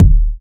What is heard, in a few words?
smooth kickdrum sound D# key, Royality free kick single hit tuned to the D# note; kick drum samples; D sharp key